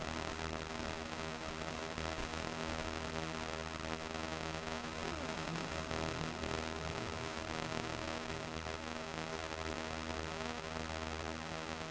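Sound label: Ambience Static Loop; viewfinder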